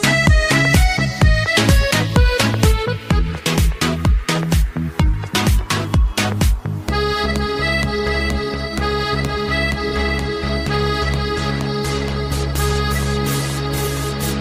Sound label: remix bollywood music ringtone